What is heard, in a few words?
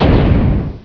button rollover; wizardwars; UI